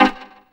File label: dance chord